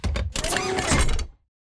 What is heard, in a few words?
combat run to idle; Titanfall 2